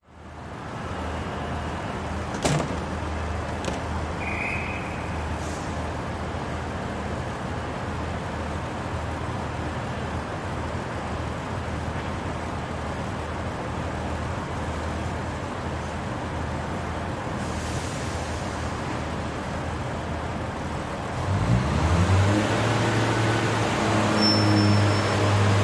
int manchester picadilly train station engine departing